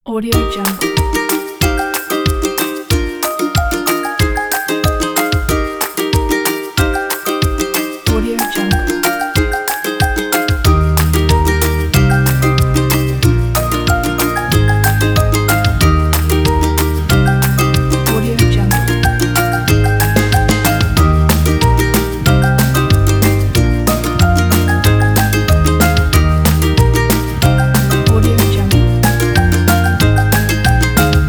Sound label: happy song